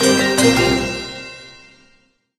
Battle Over!; Squad Busters; Android, iOS

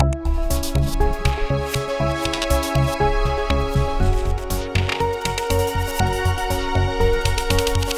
battle victory intro; Starcrawlers

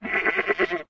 Goat Man Idle; Farm Mania 2

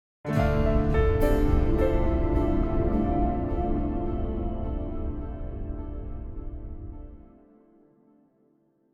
contemplative; Joe's Diner

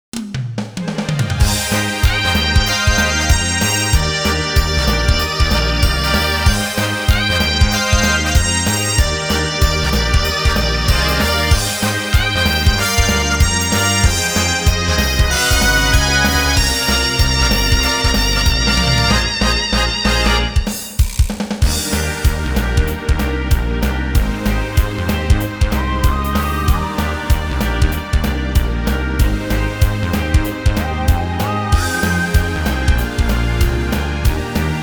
OP Instrumental; Real Mahjong Adventure Umi he Summer Waltz

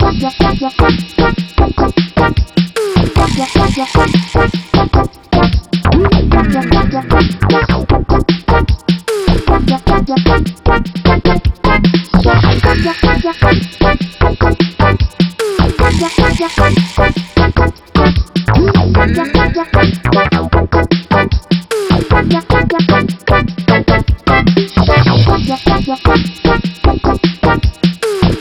Comical; Comical Final Master; bleach mobile 3d

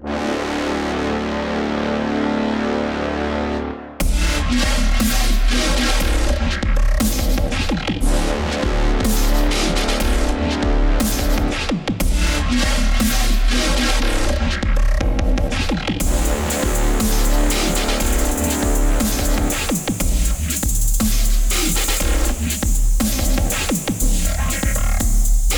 DUB; proelium windows gamerip 2018

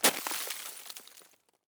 ice break; teardown ps5 windows xbox series xs gamerip 2022

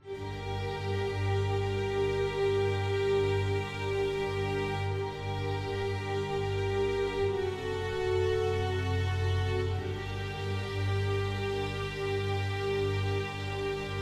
Rasta String Bass; tintin reporter cigars of the pharaoh

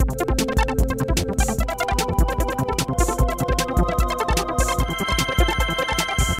Electro

